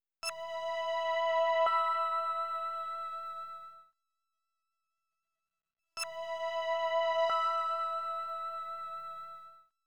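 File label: synth, B minor, Hip Hop & RnB; West Coast Rap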